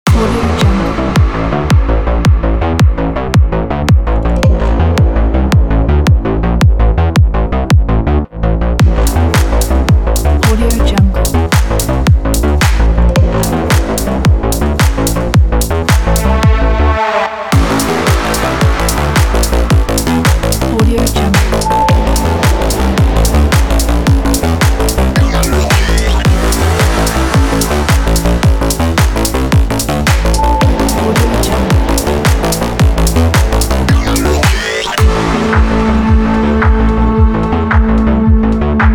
techno

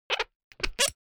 object bottle potion small close

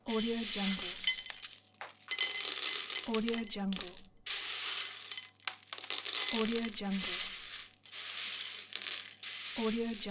cereal moving; soundrom

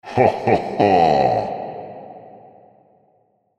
evil laugh

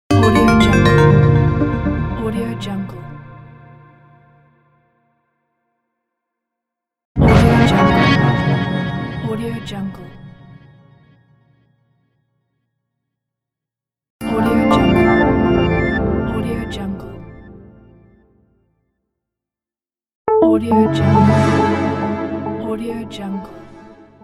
brand soft logo pack

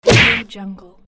punch cartoon

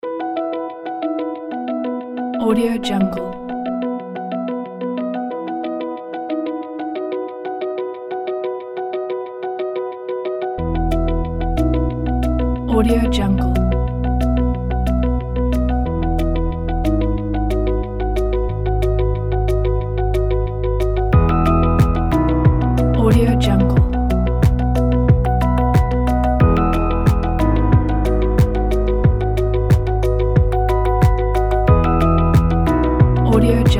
ambient music

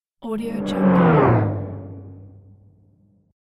whoosh

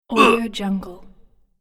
human character grunt

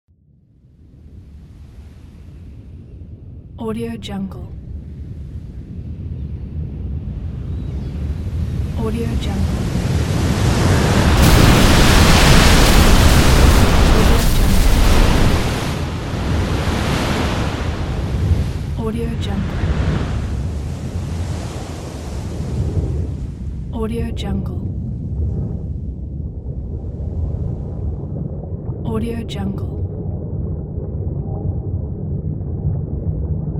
tsunami

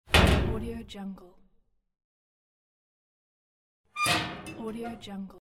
small metal gate open and close

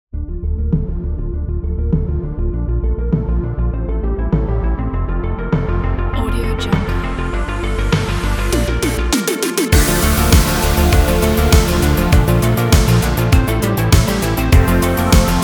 synthwave retrowave

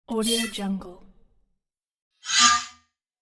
scifi device vault open or slide

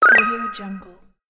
arcade ring; arialiciyan

